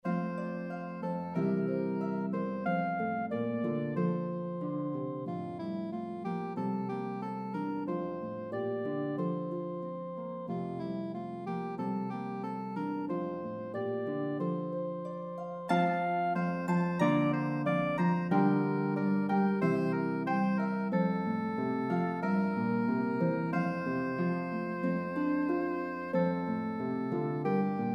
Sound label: Bb Soprano Saxophone Harp, Duet for Bb Soprano Saxophone & Harp; Shepherds Hurried to Bethlehem